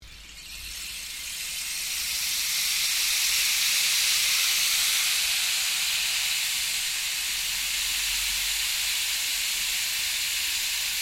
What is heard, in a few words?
Rainstick Cascade Long, Hand crafted decorative rainstick; Shaker; Musical